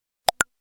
whatsapp message

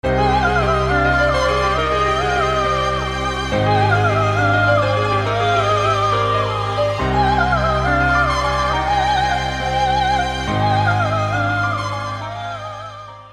Orchestra

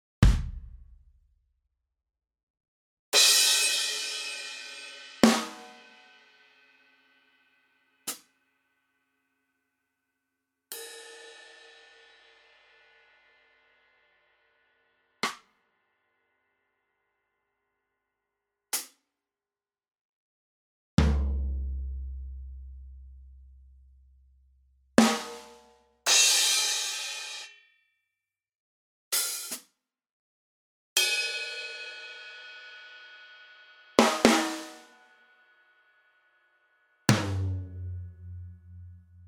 Drum

